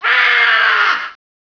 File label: scream, scientist